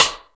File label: Rim